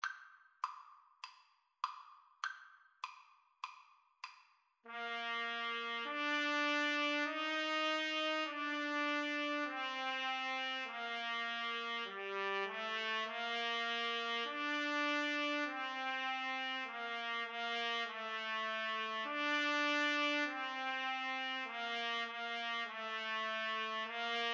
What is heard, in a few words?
trumpet duet